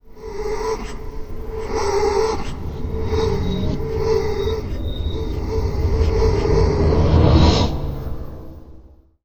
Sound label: Roll Over, Strange Ambiences; Sonic Foundry (Sony Creative Software); The Electronic Music Manuscript Collection